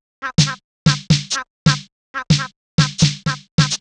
drum triple percussion 125, Drum Loops, Classic Chicago House